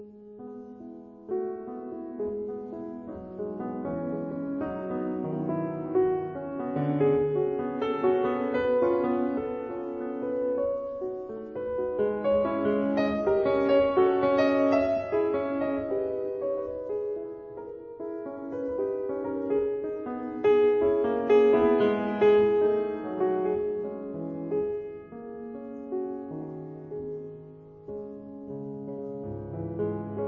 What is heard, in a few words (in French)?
Impro main gauche